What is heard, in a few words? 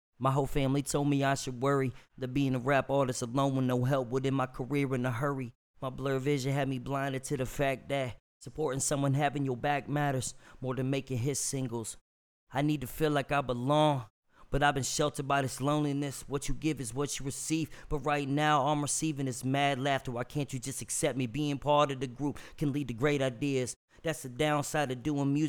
HH 147 Dm, acapella